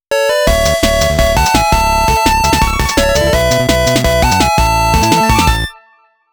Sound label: 8bit love jingle, チップチューン